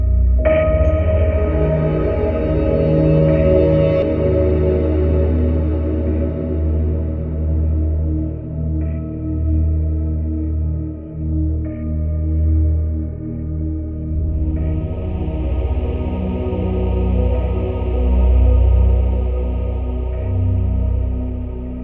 fallen loop

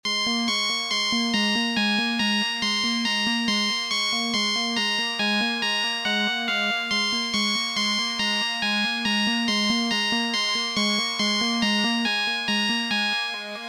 cold wonderland loop

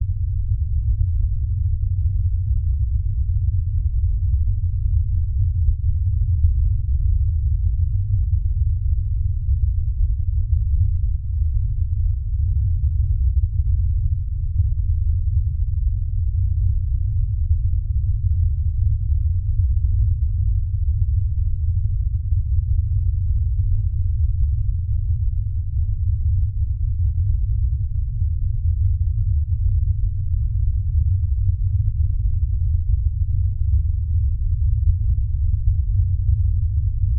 deep rumble

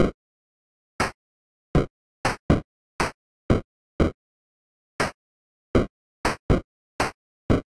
Heat Of The World drums

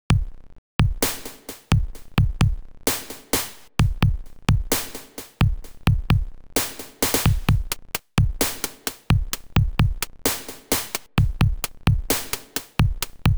Credits

